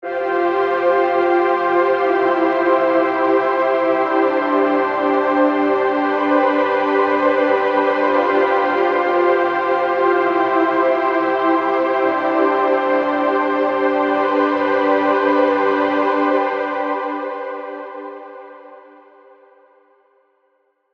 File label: Real Warm Pad